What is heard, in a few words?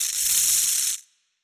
lightning muzzle electrical sparks, weapons; kaiju; Stellaris; biogenesis